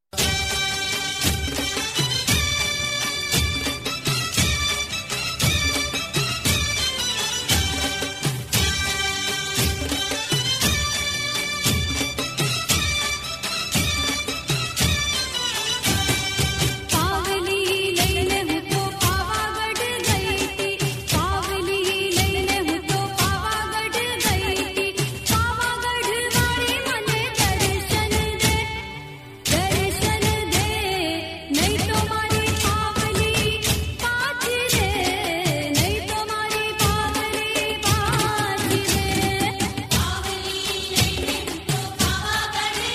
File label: Gujarati Garba